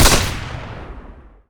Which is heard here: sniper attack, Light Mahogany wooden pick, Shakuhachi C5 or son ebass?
sniper attack